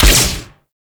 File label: Flak Explosion Small; Warframe; Eximus; Blitz